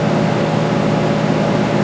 aerostat; Mafie 1

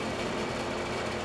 ventik; Mafie 1

